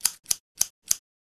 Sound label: Scissors blade open and close